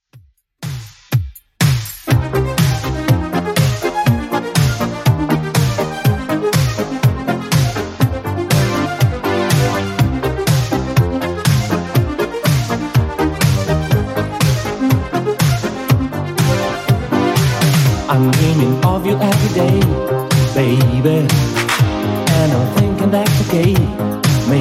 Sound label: 80s Redrum 122bpm